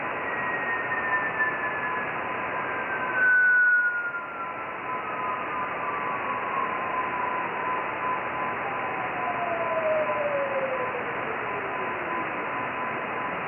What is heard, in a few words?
iss radar